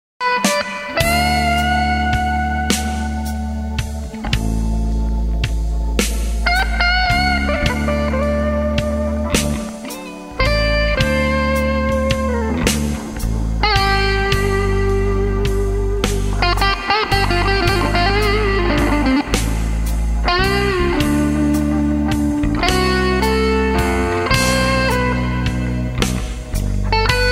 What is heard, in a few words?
blues